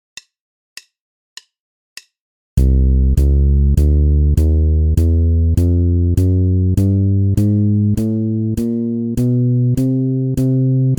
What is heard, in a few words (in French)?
Gamme chromatique de Do, basse; exercices